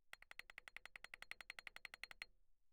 xylophone hard rubber roll mf C8; Percussion

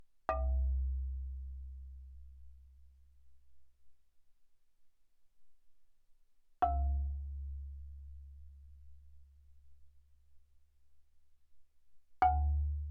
Marimba rubber mf C2 B2; Percussion

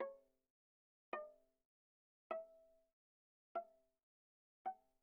Viola pizz sul G mf C5 Gb5

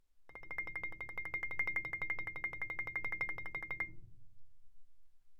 Marimba roll mf C7; Percussion

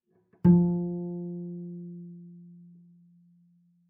Bass pizz ff sul G F3, Double Bass; MIS Pitches - 2014; Strings

